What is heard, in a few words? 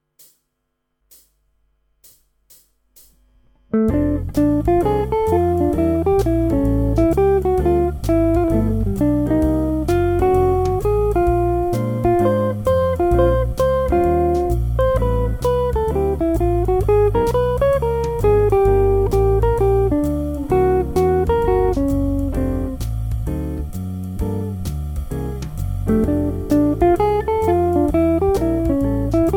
Blues, impro jazz